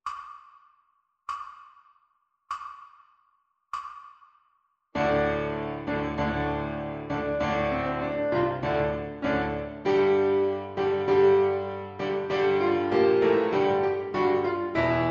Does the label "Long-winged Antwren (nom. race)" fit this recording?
No